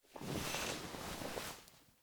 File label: Stand Up; LOOPERS